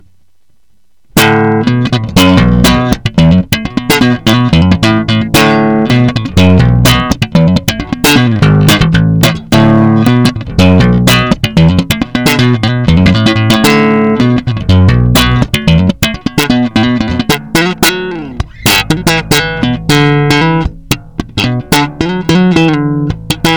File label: Active PU Neck EQ Full